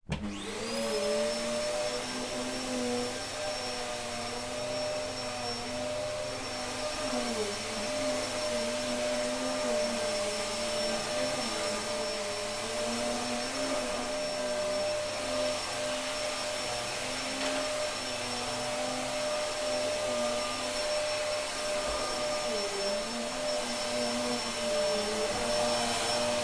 household vacuum cleaner hoover vacuuming adjacent room then move through past off